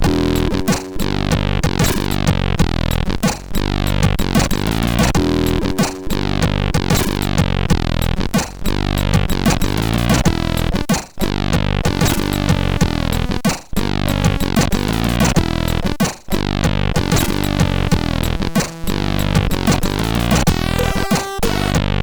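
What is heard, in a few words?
Blues, MOS 8580; hvsc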